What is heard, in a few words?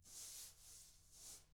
TAMA BR SW, Drums; ILIO; Double Platinum Drums 1